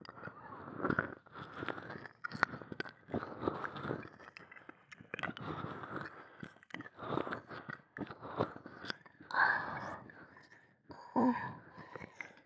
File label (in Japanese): 左耳舐め, ASMR